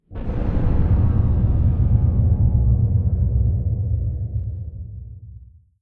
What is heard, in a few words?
distance extra dimensional ship death; ambient; Stellaris